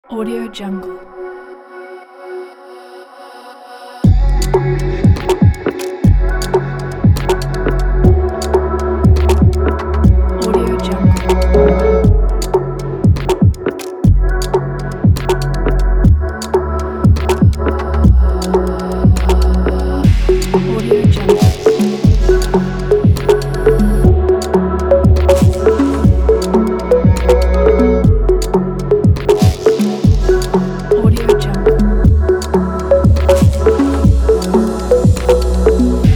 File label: urban abstract ambient